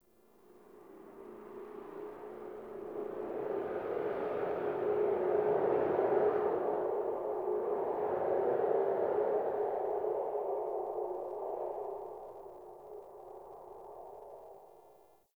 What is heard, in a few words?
Dark Wind; Warframe; Ambience; Nineteen Ninety Nine